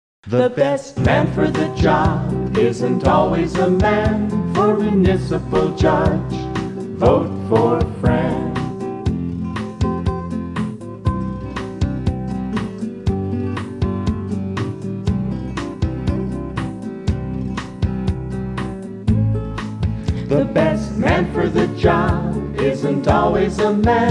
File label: Jingle